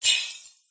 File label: buy Heavenly